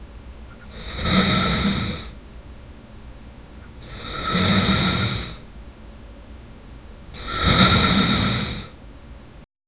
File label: a 17 year old girl is sle; regular